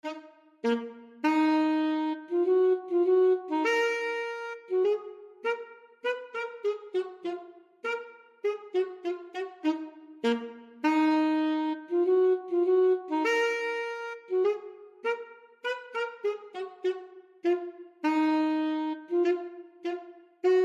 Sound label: Prelude, alto saxophone duet